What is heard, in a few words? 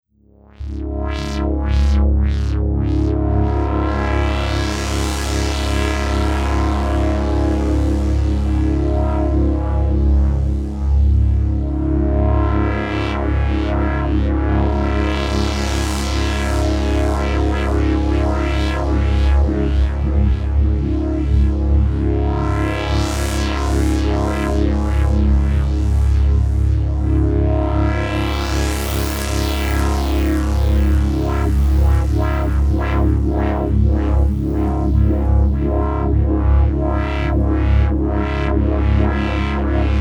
synth drone 5ths